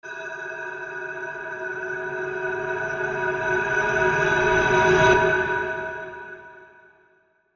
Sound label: Hollow Sweep